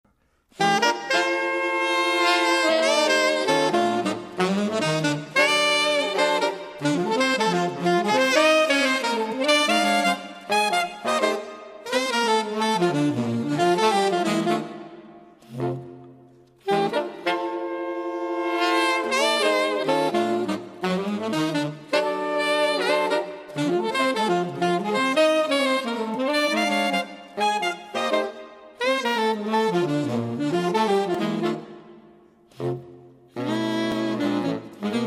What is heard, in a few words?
Jazz